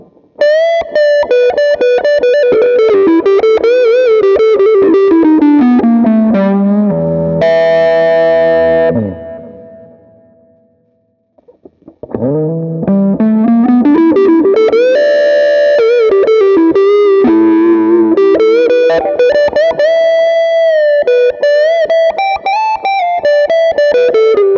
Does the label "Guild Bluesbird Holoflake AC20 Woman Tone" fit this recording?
Yes